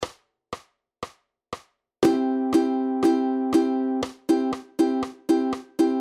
Syncopation in 2 bars

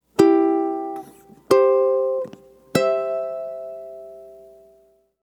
C Inversions

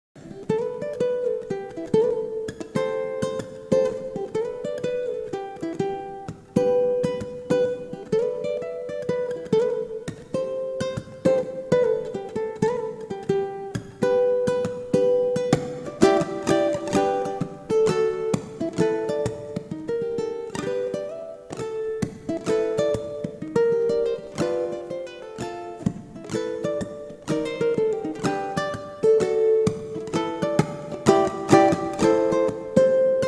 Solo Ukulele

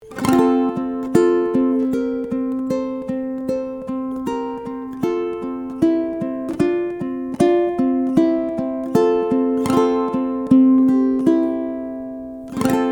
Ode to Joy Uke

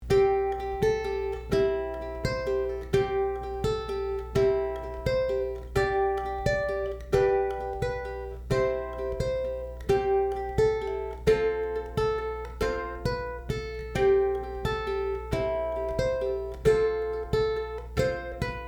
Uke; Silent Night

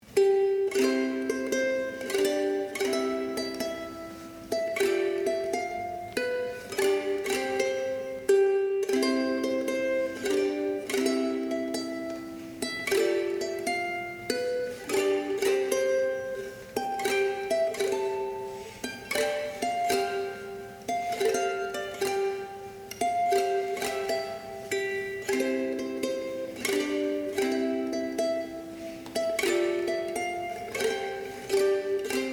Xmas Tree Uke

